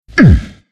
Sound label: pain; earthshaker